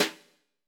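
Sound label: brush snare; Greytsounds; Scott Peers Sound Engineering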